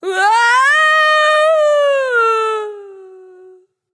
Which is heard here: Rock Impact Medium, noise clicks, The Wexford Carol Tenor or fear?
fear